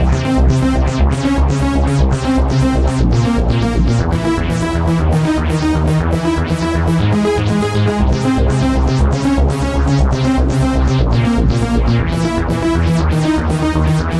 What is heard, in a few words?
Synth Arpeggio, Synths; Eclectic Electronica